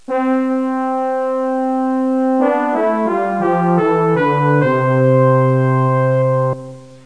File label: fr horn; Music Game (1994)(Microforum)